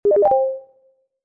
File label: Popup Appeared; Salem's Sound Schemes